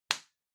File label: Spice Mill Open